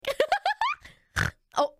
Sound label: giggle snort